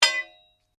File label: Blade Heavy Moving Impact, Sword; Foley sounds for melee weapons; Combat